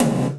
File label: DANCE SET, DRUMS